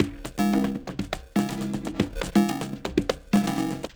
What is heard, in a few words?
Conga, Percussion Loops